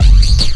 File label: beat, drums